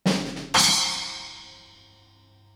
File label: drumroll splash